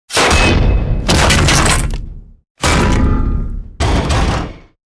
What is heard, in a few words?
Metal debris